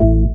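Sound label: synthesizer Orrrrg A; Best Service Gigapack 1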